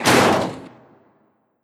Destroy Death Imp

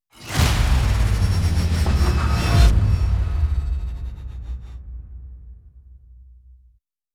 Music Cool Down; Tokyo

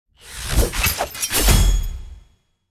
Hacking; starbites

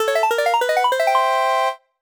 Item Jingle

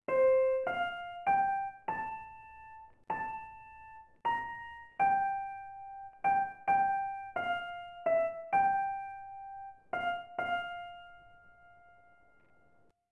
ending piano

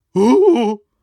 Maggot Startle

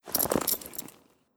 Cloth; Legend of Keepers; Mecano

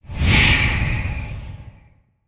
Damned Tourment Wind

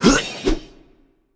Paladin M Cast

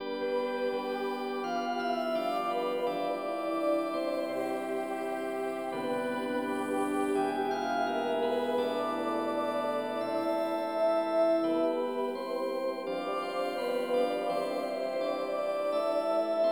Loops; Tales of Fandom Vol 2; PS2

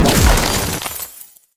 Ice Explosion

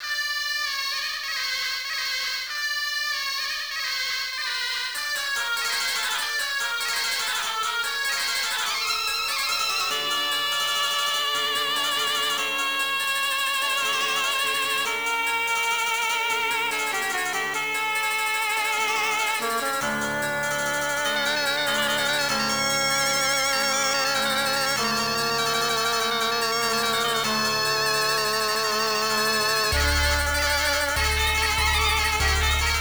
Arabian Wind (Mosque BGM); martial champion arcade

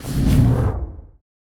Flag Wave; Monster Jam Steel Titans

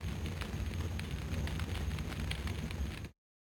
Fire

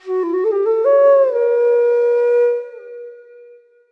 Lock

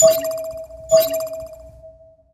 Hailing; battle suit aces

